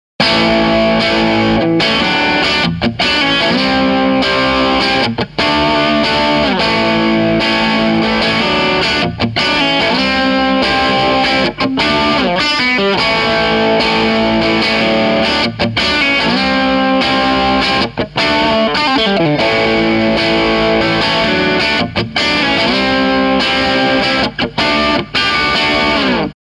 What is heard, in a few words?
Crunch